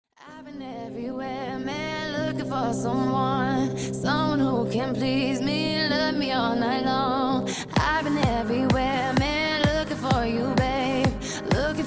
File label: MUSIC SOLO COMMERCIAL HIP-HOP